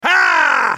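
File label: spellcast effort; ZhaoYunXinZhao; Character Voices - English; en US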